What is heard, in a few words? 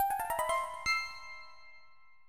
Jingles